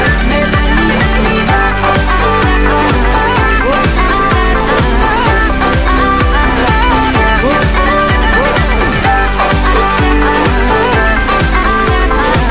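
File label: background Tone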